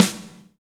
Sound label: Reg. Snare; Snares